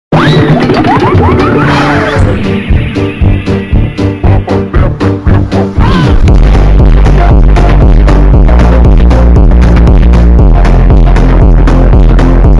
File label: phonk